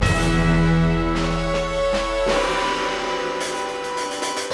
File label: Action START